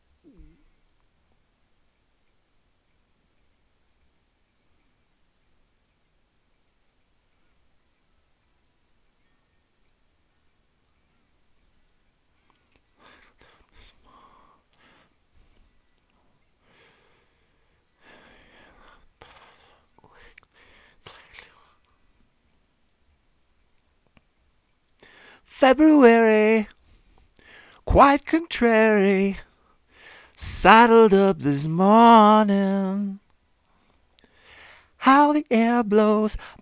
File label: Bright Vocal